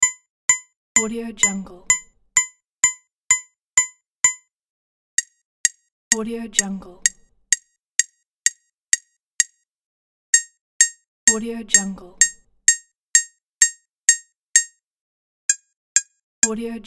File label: slow counter